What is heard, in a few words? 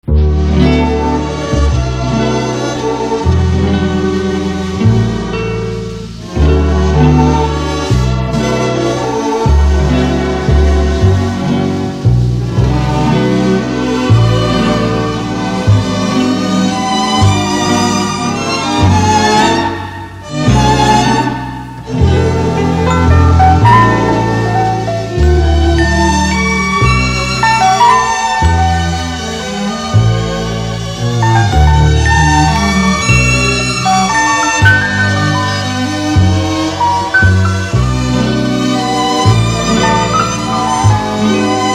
Piano Strings